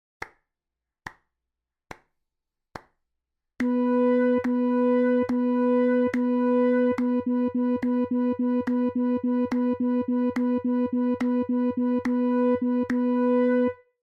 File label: Rhythm Practice